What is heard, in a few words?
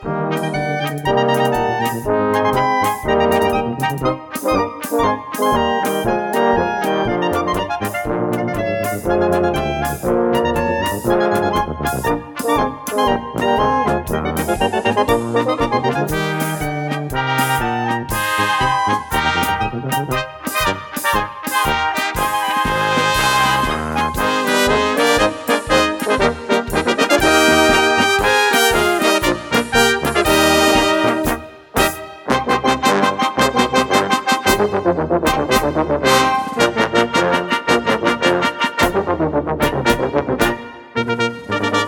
polka; Kanianska